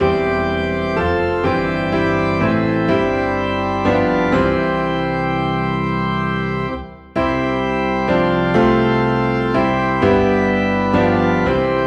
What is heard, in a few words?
Piano and Organ Low in C